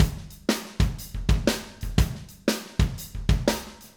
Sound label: Acoustic Live Drum